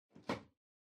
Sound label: Power Strip Far Floor Gentle Unplug